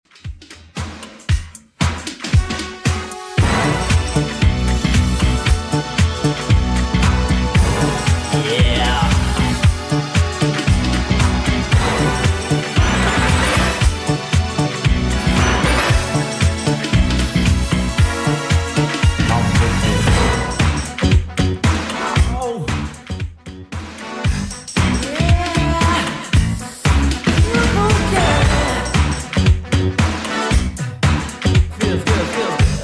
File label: 80s Redrum 115bpm